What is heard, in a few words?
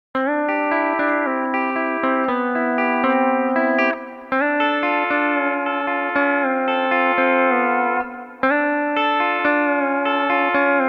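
Pedal 4 + outer lower string 5, D13 steel guitar; LKL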